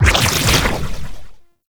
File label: Health Leech Pulse; Eximus